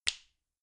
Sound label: button Click; Temple Run